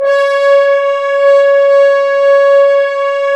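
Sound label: BRS, French Horns Dry f; Roland L-CDX-03 Disk 2; F.Horns 2 f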